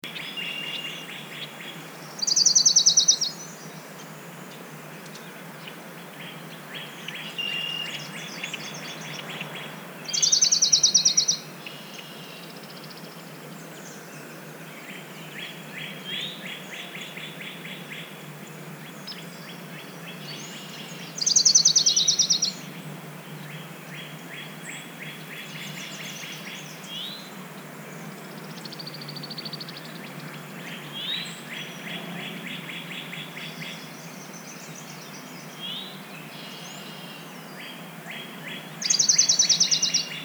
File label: Orange-crowned Warbler, Leiothlypis celata celata; warblers